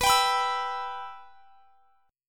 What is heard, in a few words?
B Suspended 4th Sharp 5th B; Bsus4#5; strummed; x,x,21,24,24,24